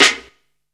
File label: monstrous, snares; Zero-G; Funky Elements